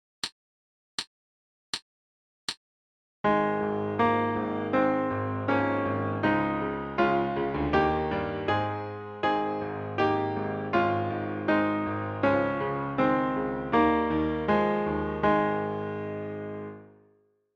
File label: A flat Concert Quarter Notes